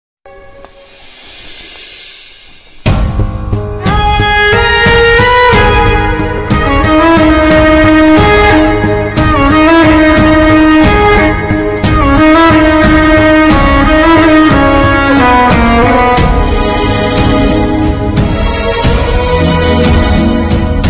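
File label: sad background music ringtone; sr kalyana mandapam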